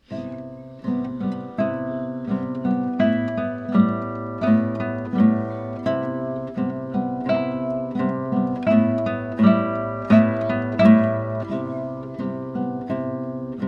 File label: Meditation, guitar